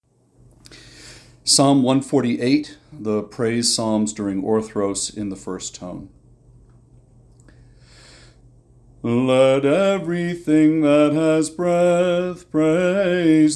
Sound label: Tone 1, byzantine music; Psalm 148